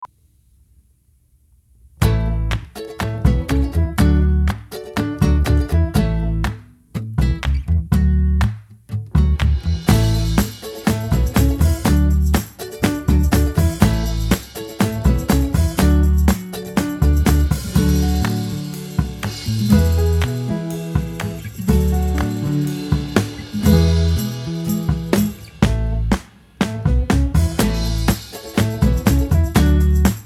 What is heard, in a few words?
The Sound of Tubes